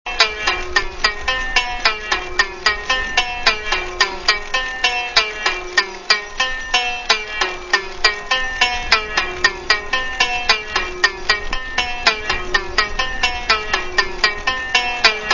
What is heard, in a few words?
valiha